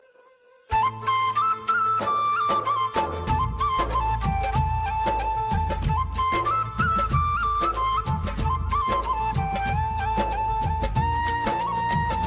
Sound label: flute shiva ringtone